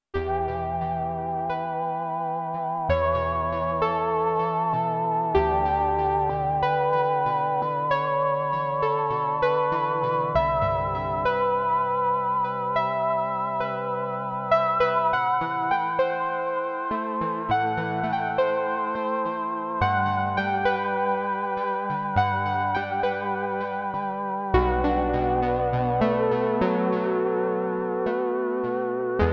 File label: PIANO & ENSEMBLE, SIEL DK 80; Factory